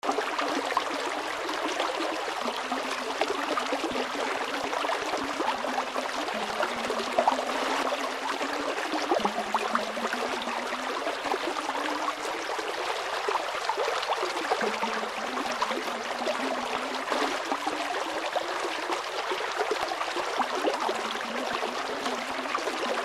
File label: water gentle flow